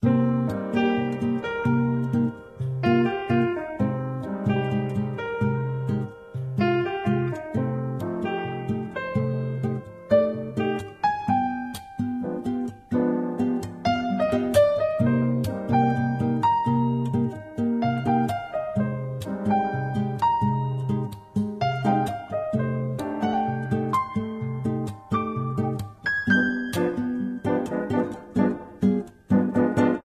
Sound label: Bossa Loop Piano